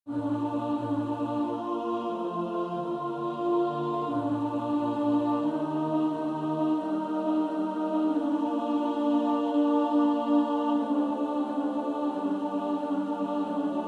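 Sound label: Who is on the Lords side alto, choir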